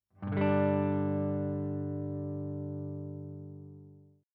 G open

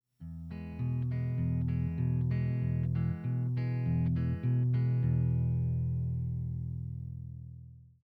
E Chord with Bass, guitar

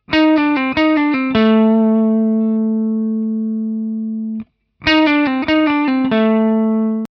Bluesy lick, guitar

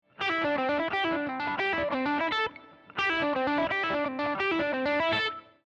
Lick, guitar

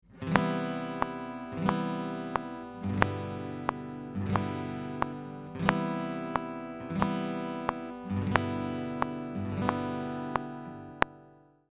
Strum 1 and 3, guitar

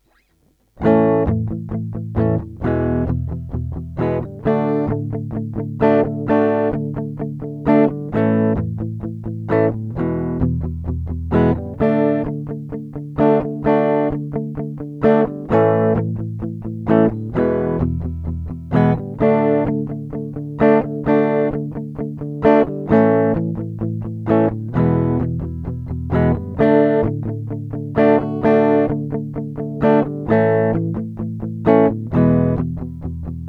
a backing track

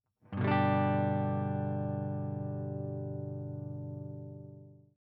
G Chord, guitar

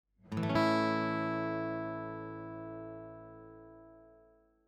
A Chord, guitar

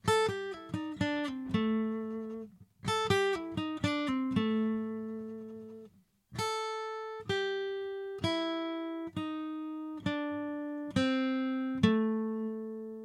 Blues lick, guitar

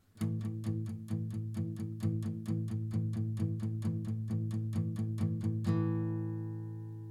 palm muting, guitar

